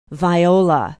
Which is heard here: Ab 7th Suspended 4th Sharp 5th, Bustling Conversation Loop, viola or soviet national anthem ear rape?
viola